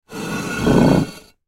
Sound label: Horror Coffin Lid Slide Rock Slate Movement; Stone Door Sound Effects; Blastwave